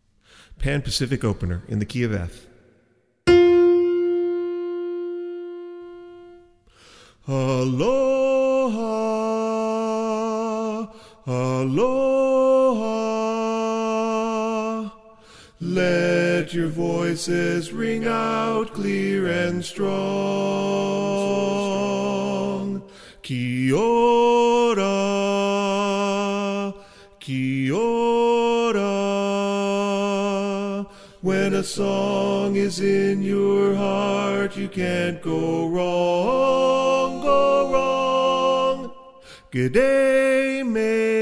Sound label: Lead